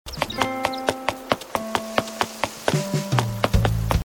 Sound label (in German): stein; acnh